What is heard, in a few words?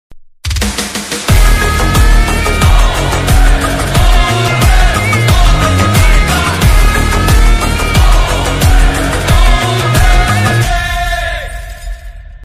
bgm ringtone; devara movie song